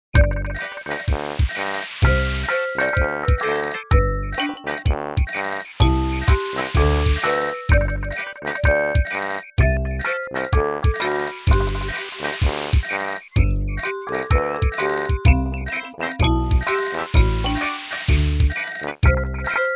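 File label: beach, Klingeltöne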